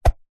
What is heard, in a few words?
foley stick plastic swipe swing through air whip; zapsplat